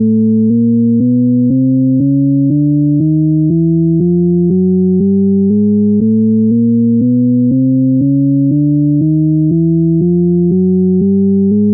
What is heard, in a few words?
Shepard Tones